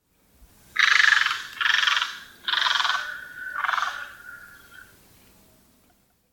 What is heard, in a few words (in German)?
Silberreiher Casmerodius albus Abflugrufe; Vogelstimmen